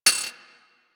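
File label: machine gun mech; Weapons; Archwing Mach Gun B; Warframe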